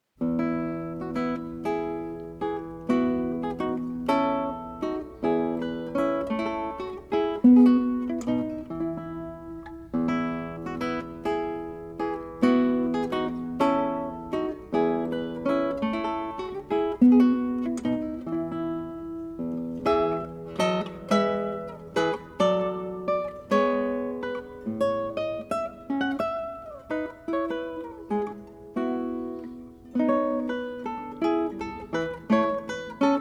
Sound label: baroque guitar - a new irish tune; purcell